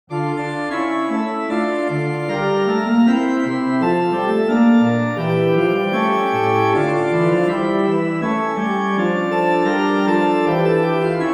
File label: Historical organ Baroque Schnitger ll